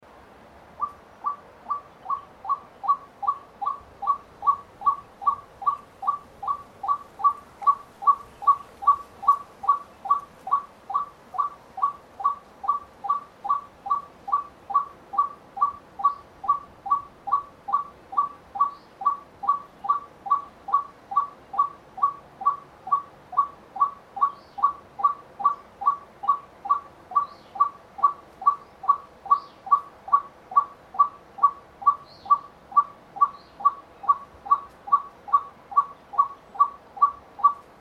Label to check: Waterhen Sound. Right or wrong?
Right